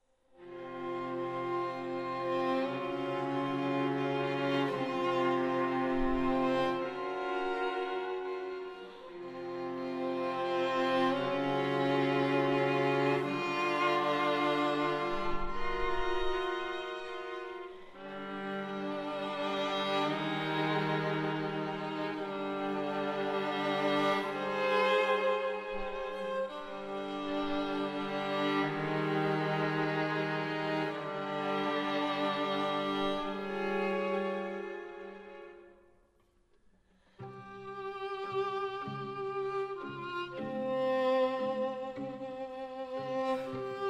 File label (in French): Quatuor a cordes; Choveaux